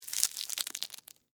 Sticky Rubber, Texture, Sticky, Short, Rubber, Gloves, Movement; Cleaning; BlastWave